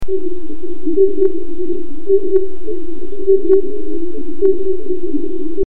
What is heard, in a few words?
voice dollarbird